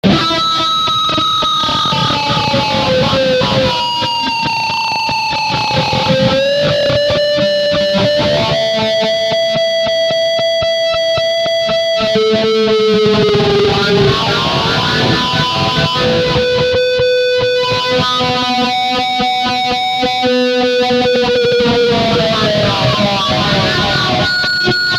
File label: Delay Before Dist; Echo beating